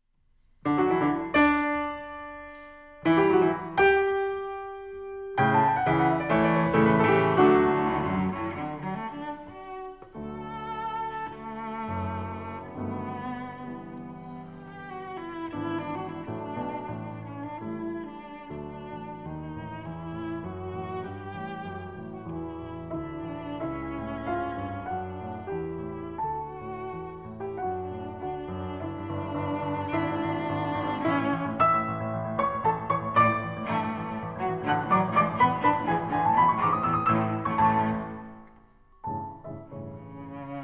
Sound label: Sonate D dur, cello; Beethoven